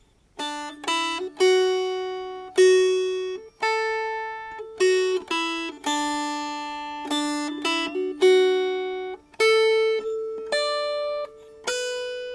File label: Melody